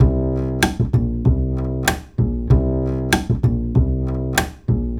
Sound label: Acoustic Bass; Sonic Foundry (Sony Creative Software); Leo Cavallo Bass Taster